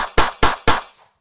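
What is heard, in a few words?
double shot air gun; pnevmatika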